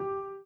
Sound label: Piano Chord